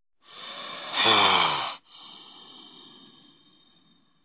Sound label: Snake, Bull Inhale Then Exhale. Close Perspective.